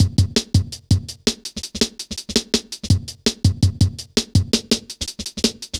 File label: Skippy, Drum Loops, Jungle